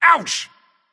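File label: nonverbal ouch; smite; voicepack; merlin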